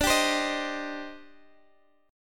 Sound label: C Minor D; Cm/D strummed